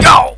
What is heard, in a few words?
fall; openffa; potator